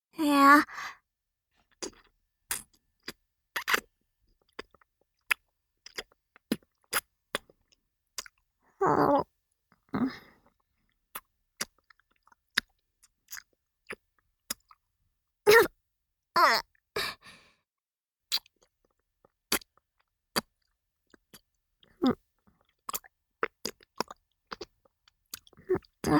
チュパ音抜粋集

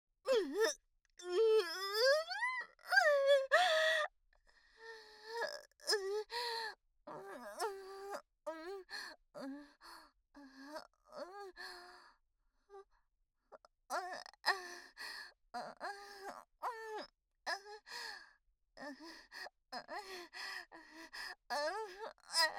レイプ SEX・無理矢理に媚薬を使われ泣きながらの激しい喘ぎ声と悦びの声; お色気お姉さん系カノジョ

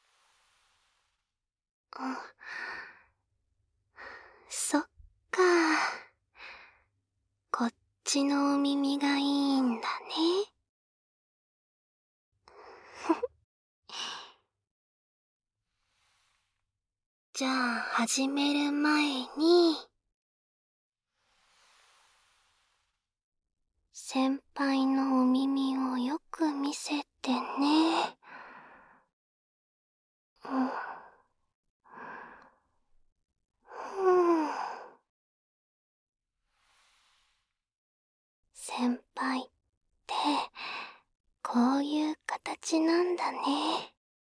右 耳かき大きめ, 耳かき音大きめ

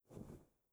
布ズレ, ASMR

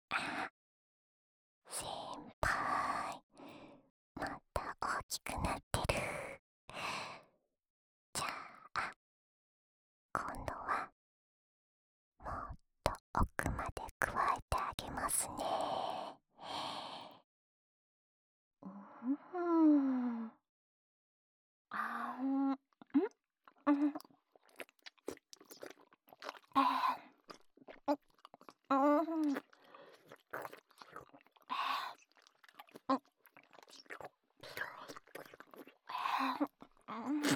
フェラループ, ASMR

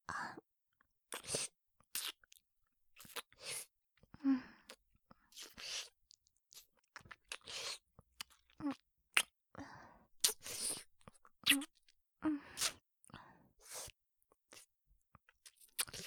お耳…舐めちゃいます(左), ASMR

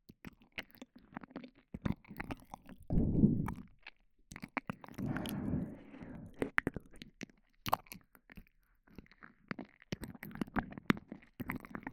耳舐め 右; 『濡れ姉妹女将』-癒し女将音声- 耳舐め 耳かき ハイレゾ

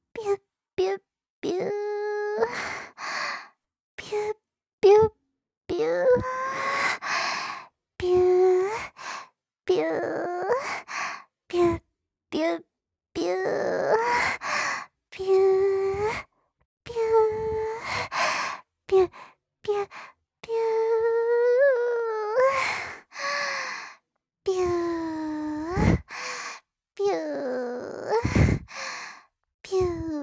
ぴゅっぴゅ～っ, 左耳, ASMR

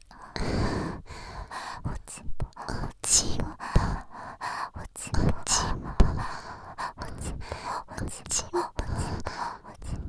喘ぎ; お屋敷メイドのハーレム淫語ヘヴン 気が済むまで耳舐め淫語を堪能できるバイノーラルオナサポ音声

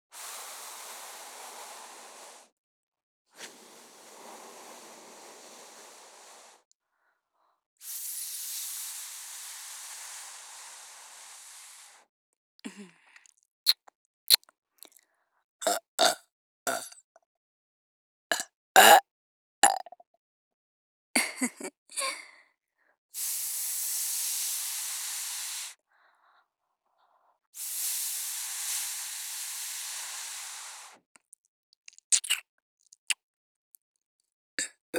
お耳 ふーふー ちゅっちゅ ゲップ; オナサポパート

